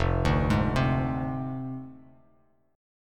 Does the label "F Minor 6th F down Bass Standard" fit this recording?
Yes